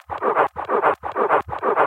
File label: VFX2 Loop 128 BPM